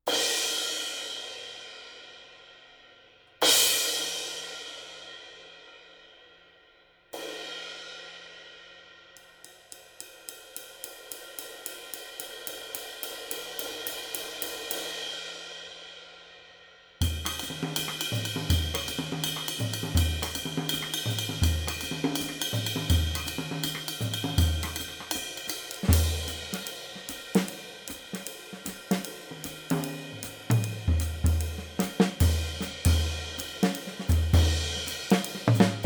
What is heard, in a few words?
20 K Con MTH, Zildjian